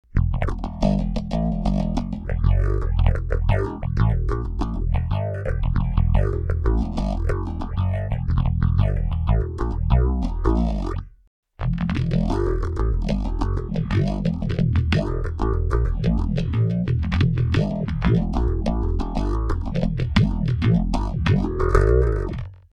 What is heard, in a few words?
Metal Phaser